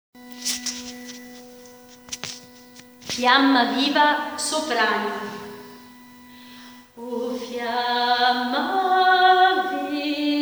Fiamma viva soprani

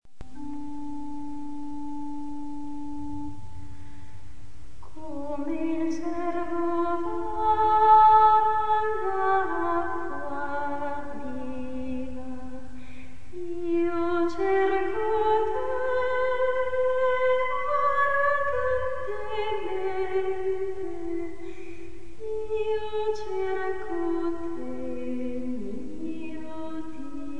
soprani

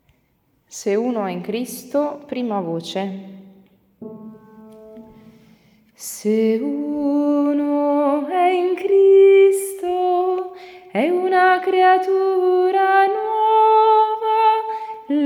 Soprano

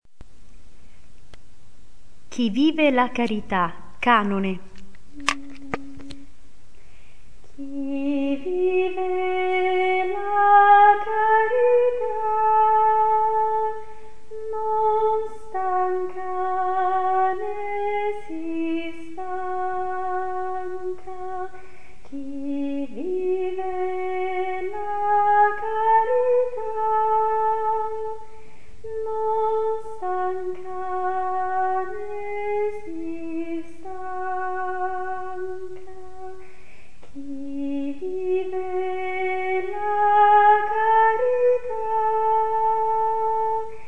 canone

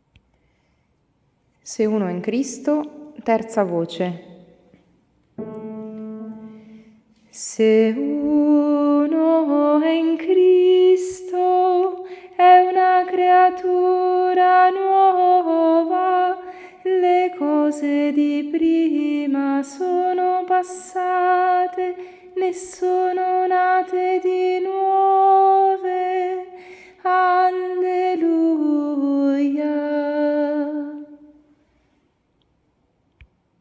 Contralto

